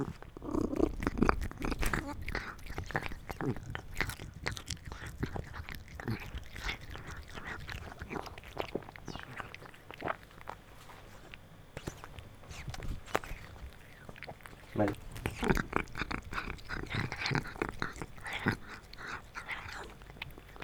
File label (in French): Chat corse qui mange du Figatellu; Chat corse affamé qui mange du Figatellu et la bonette anti vent, stéréo; Vers Corte, Corse